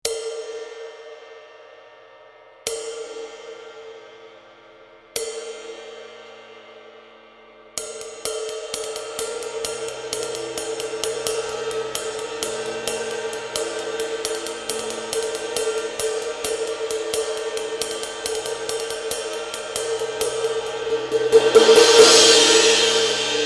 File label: 22" Gold Ride, Bosphorus; cymbal; 3471g